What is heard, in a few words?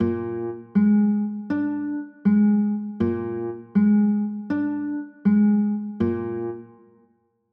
b flat 7, chords